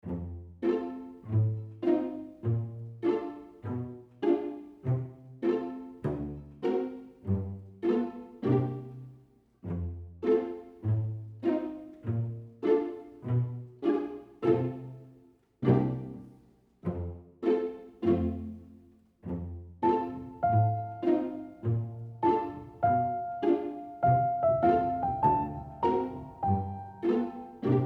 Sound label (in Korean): 귀여운; KID